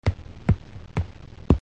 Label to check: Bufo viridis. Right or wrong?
Wrong